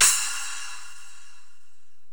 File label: Korg x5, Drums